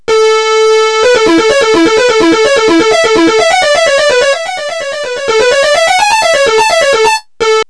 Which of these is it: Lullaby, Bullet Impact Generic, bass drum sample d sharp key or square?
square